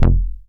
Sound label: Bass, 2600 F1, Synths, ARP 2600